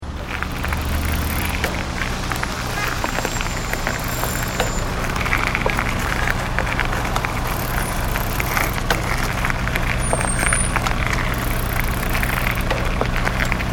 Cycling In Traffic, Cycling in urban setting with heavy traffic.; Bicycles (Sound Effects:Vehicles)